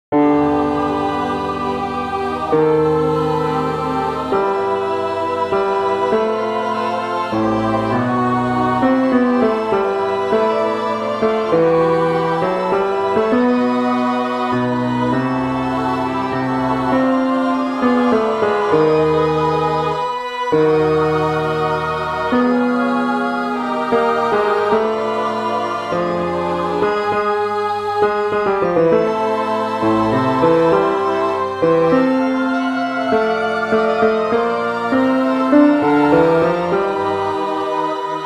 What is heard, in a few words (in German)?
Bass Klavier